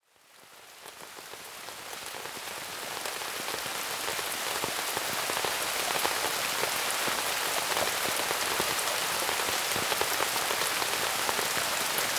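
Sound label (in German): Regen zum Einschlafen ohne Musik Regengeräusche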